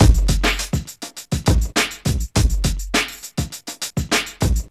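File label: 102 bpm, Funky loops; Zero-G; Funky Elements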